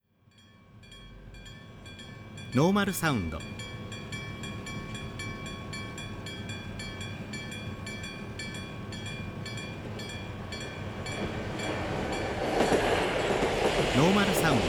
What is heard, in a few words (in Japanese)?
fumikiri